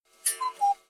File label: alarm